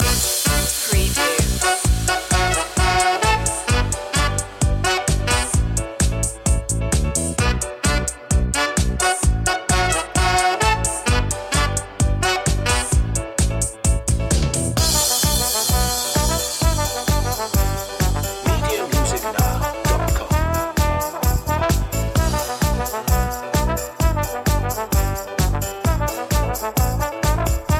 funk that jazz loop long; media music now

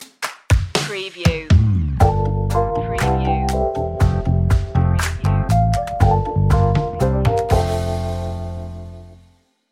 keep calm and groove intro

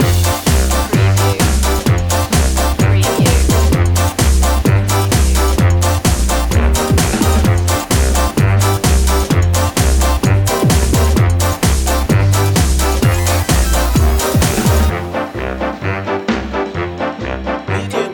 electro swing

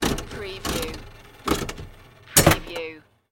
Slot Machine Wheels Spinning And Stop; Casino / Slot Machine Sounds

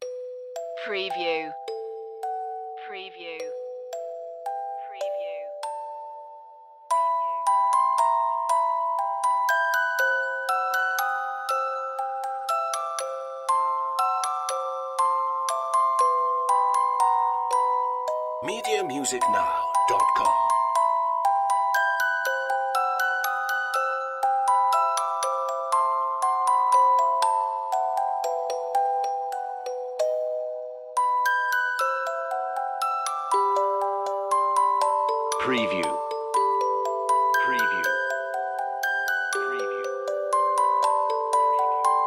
spinning ballerina music box

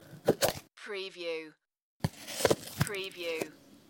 Plastic cup, open and close lid

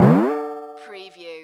CARTOON TYMP BEND UP

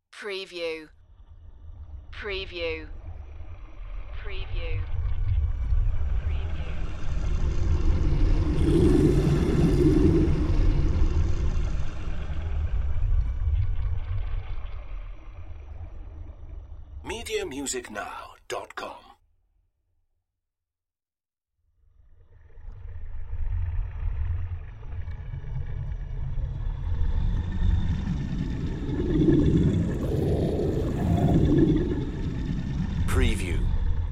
deep bubbling swooshes

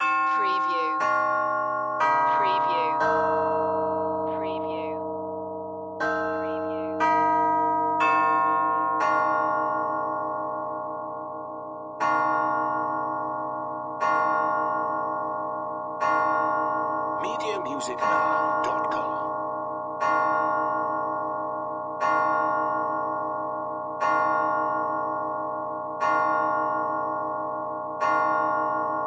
Grandfather Clock, Strike Twelve

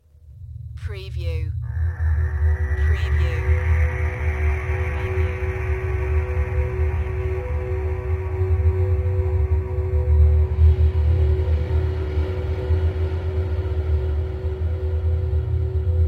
Horror Scifi Soundscape - In The Depths

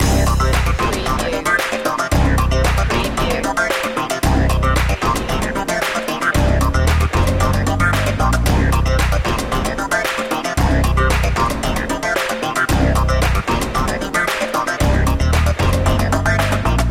rhytmic soul loop

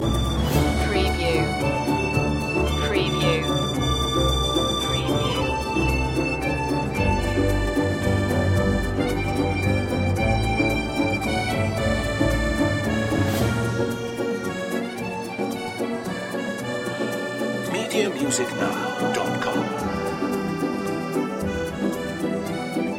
opera loop

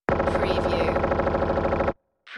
Machine Gun

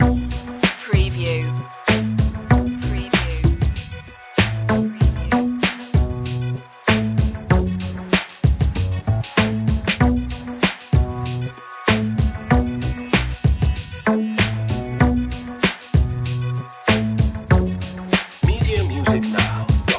funky hip hop loop

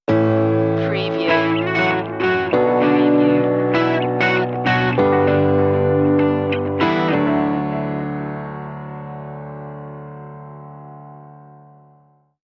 dreamful stinger

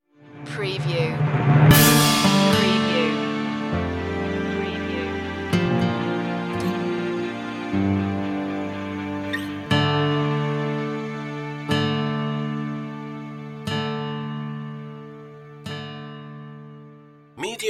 stinger

